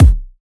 rich high end steel kick drum sample d key, steel kick drum sound